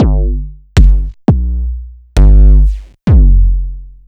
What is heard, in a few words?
kicks; brootle